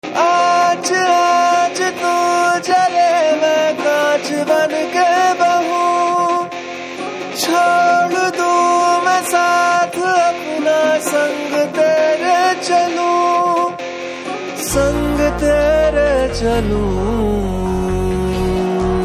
song ringtone; yaara movie

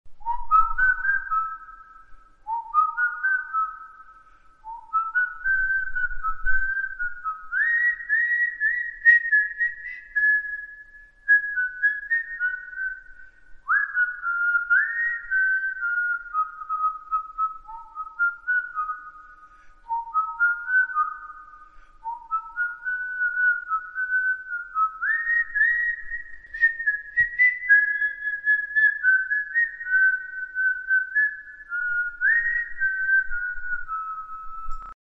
Bella Ciao Whistle Cover Ringtone

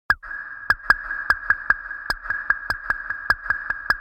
New Iphone Sms

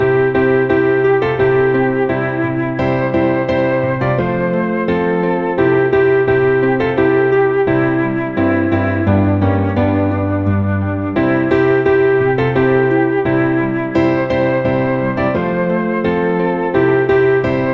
Great Melody; iPhone

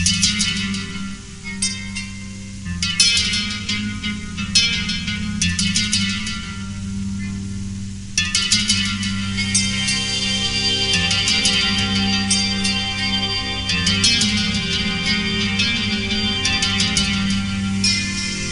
guitar ringtones

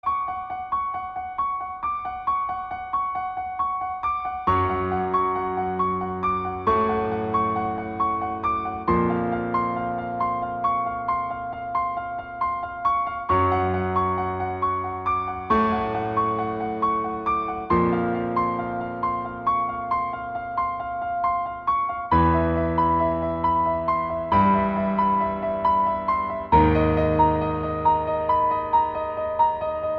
Halloween Piano

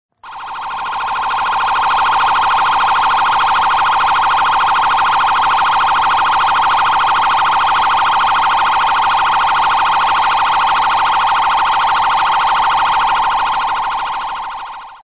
Bgm ringtone; Gojek; Grab; Ojol

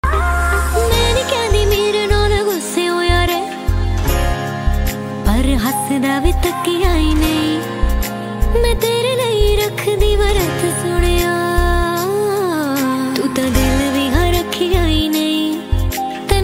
female cover

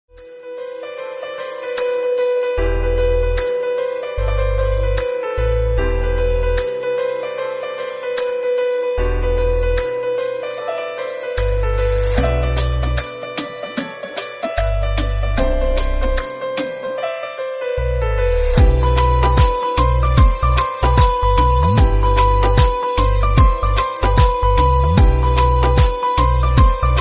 instrumental Bgm

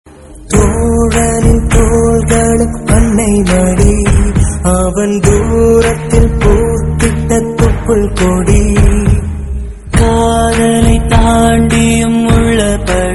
Tamil melody ringtone; Friendship Songs

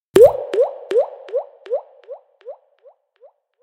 Water Droplet

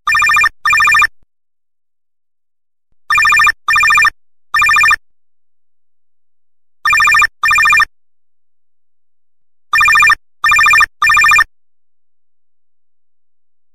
Classic Cell Phone

